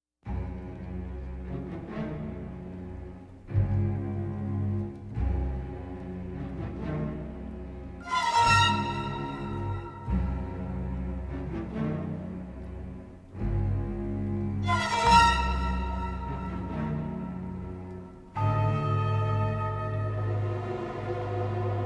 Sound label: For Strings, Flutes, and Celesta